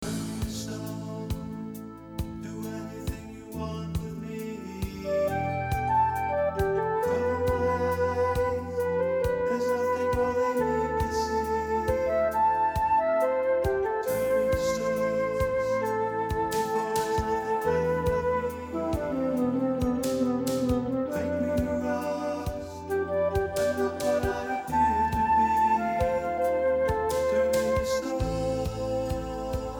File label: Flute